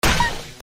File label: explode sound; bocchi